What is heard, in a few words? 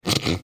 snorting